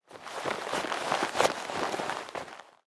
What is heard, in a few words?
MOVEMENT PEOPLE; SIMUZENSOUND